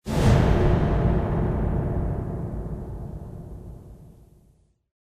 tone metal movement; video games; ambient; Source Engine; tf; lair

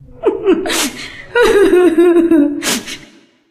walking cry, witch voice; npc; source engine; left 4 dead 2

